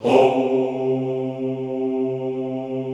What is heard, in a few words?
HOUUU, Classical Choir